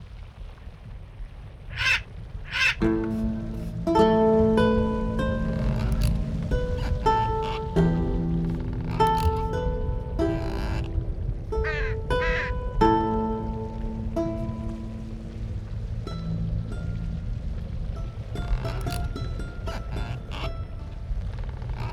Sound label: Ambient; Legends of Eisenwald